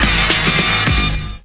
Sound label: hard hittin horn break